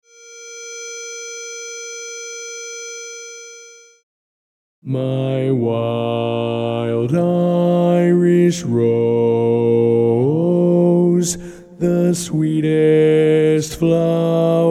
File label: Bass